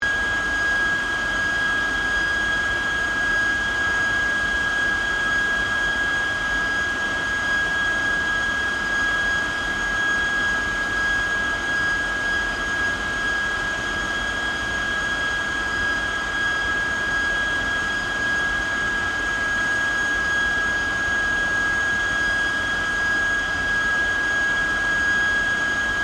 AIRCRAFT GLOSTER METEOR F MK8 IDLE LOOP, Gloster Meteor fighter jet; Airplanes; Vehicles